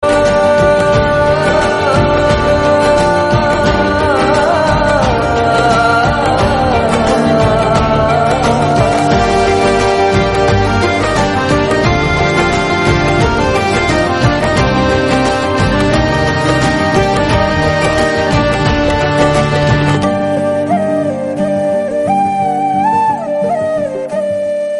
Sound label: BGM Instrumental